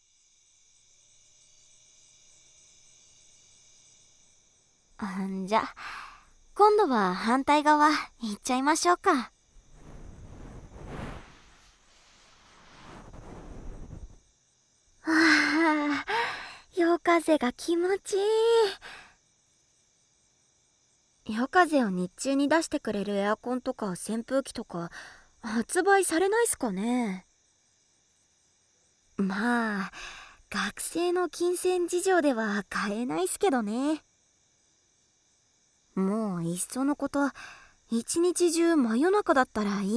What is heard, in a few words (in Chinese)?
吹耳朵、右耳、夜风, ASMR